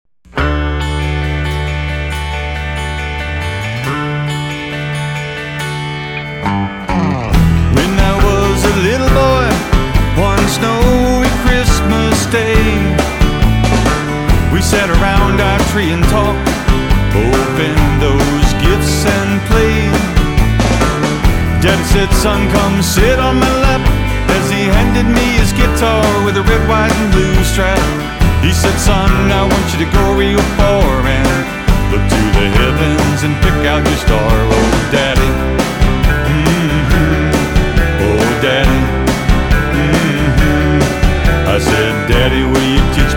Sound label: guitar sample